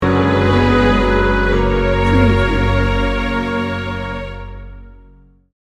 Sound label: cinematic orchestral sting bumper